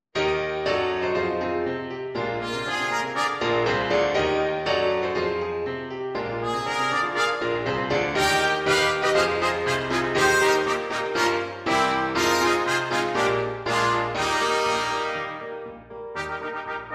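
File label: Three Trumpeteers with piano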